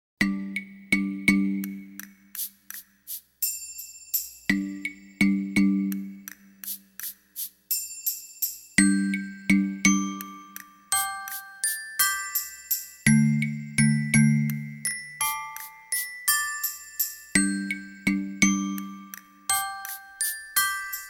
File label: Mallet Dance